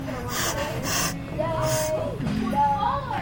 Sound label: intense sniff